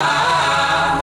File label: Key chant; luxembourg zwee